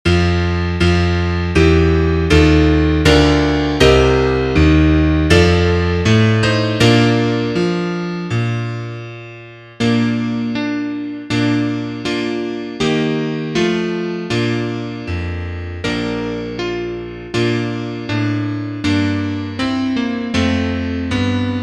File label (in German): Klavier Solo, trompete; Abendsegen